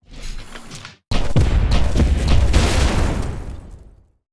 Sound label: Grenade Rampage; Zerom